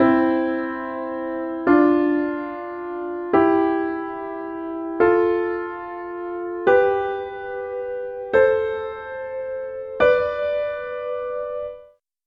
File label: basic thirds